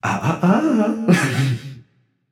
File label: disagree, voicepack; smite; jorm